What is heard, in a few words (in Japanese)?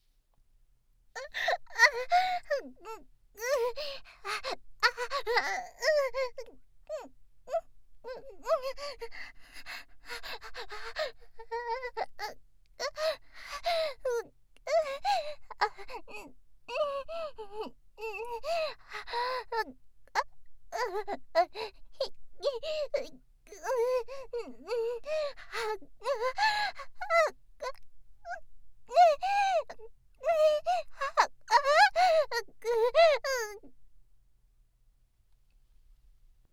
大きな喘ぎ